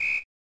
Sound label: Whistle